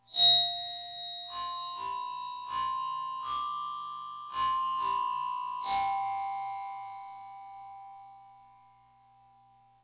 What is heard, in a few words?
Glockenspiel